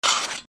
pneumatic blast

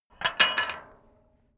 metal gate bolt

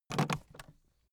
aluminium case pick up

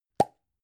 gum plastic box open hard

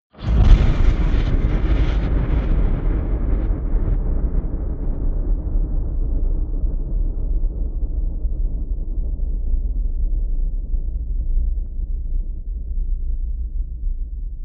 eerie burst large rumble

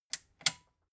metal button click power off slow

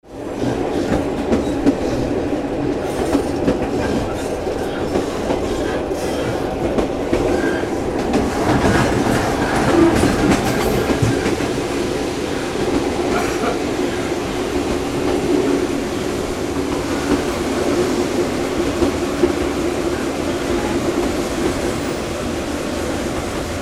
train cabin inside wheels

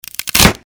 wood short breaks

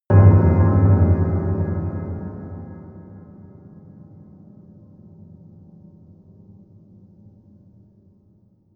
bell hit low

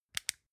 flashlight on fast

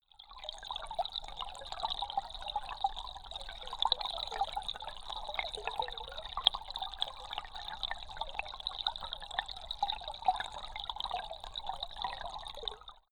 stream

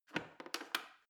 plastic button creak defect press

